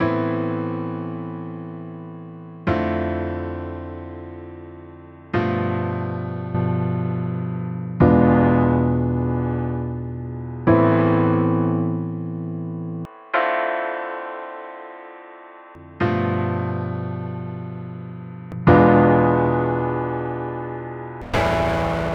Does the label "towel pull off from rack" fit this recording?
No